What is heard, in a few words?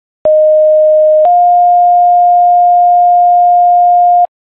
siren; clinton